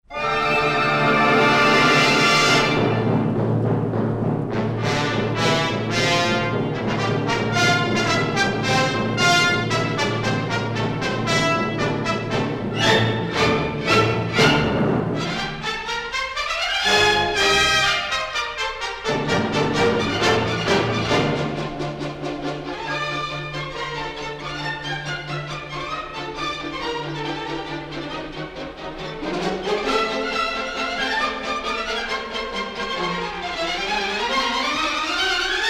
Symphonie en ré mineur Allegro non troppo; CHOSTAKOVITCH; n° 5; op. 47

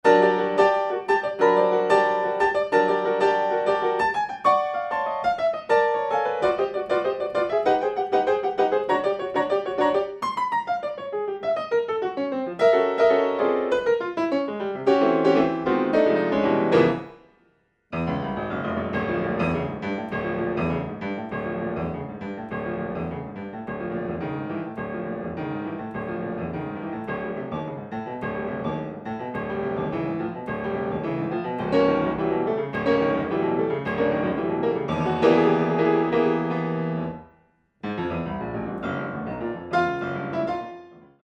Fantaisie sur des rythmes flamenco Rumba rapide; MARTIN

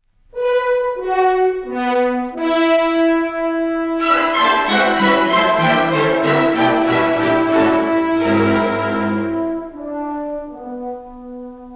Concerto pour violon et orchestre n° 1 en mi mineur Allegro; RAKOV

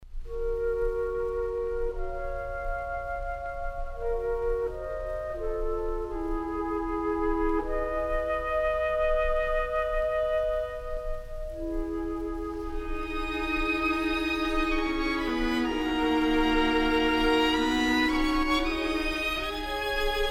Introduction et Allegro pour harpe flûte clarinette et quatuor à cordes; RAVEL

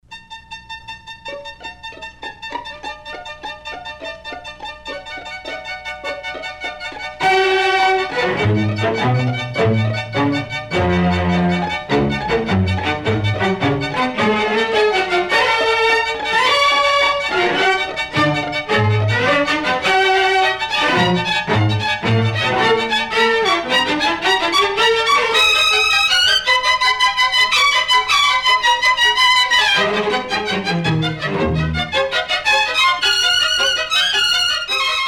SYMPHONIE POUR ORCHESTRE A CORDES ET TROMPETTE Vivace non troppo Presto; HONEGGER

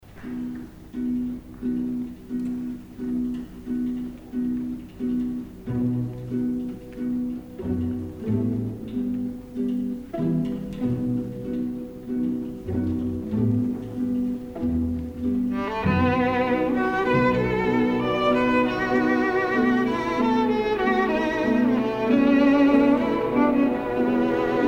Concerto pour violon Allegro non troppo; BARTOK; Sz 112

